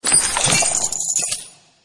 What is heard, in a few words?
Object Gain